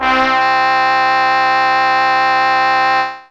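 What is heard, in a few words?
Brass Section C4, Fairlight CMI IIe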